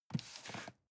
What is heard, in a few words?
cardboard box medium grab slide soft; objekte